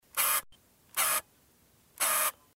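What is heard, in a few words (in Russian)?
zoom kamery, camera zoom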